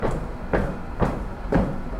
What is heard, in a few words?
steps